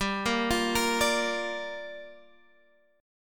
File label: G Minor 7th, Guitar, Standard; Gm7 arpeggiated; JGuitar